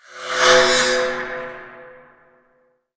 crystal skull touch con risa

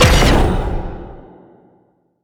helm of blackburn ranged attack

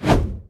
pole spin loop end

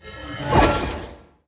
enemy harvester duplicate; sfx

